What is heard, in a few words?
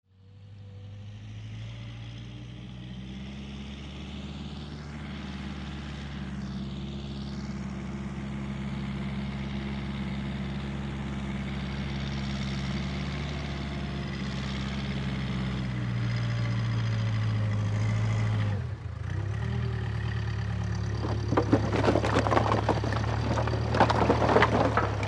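excavator with horn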